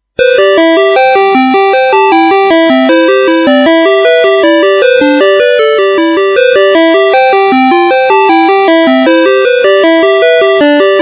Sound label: Oh Susanna, doorbell; 门铃音乐芯片/苏三娜